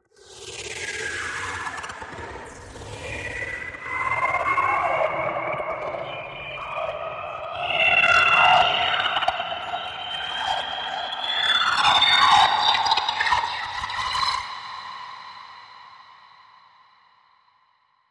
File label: Dirty Riser